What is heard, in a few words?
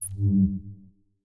Button Bottom Bar Click; Custom UI Sounds; The Division 1